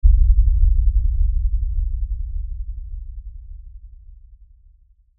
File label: Impact Lfe Rumble; Impact Lfe Rumble Deep Hit; Low Impacts (Sound Effects:Impacts); Blastwave